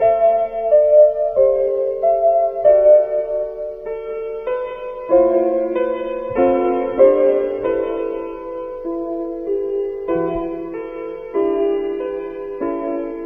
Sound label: piano silo down, sad; Loops